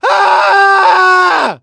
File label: fail